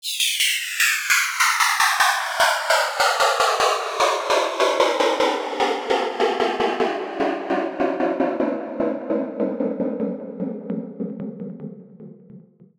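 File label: downlifter 150 8 bar rhythmized; FX; Virtual Riot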